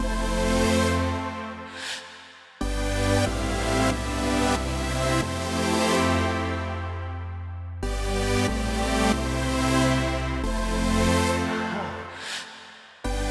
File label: drop no drums